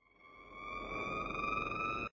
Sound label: drop vibrate